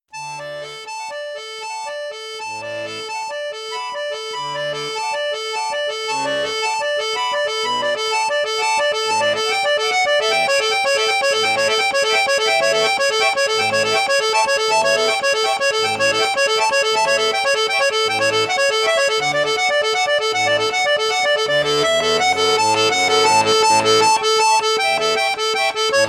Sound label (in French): registre piccolo, accordeon; pigini star jazz